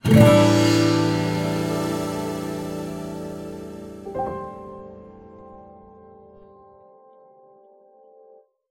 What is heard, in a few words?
Sand Surfing Chord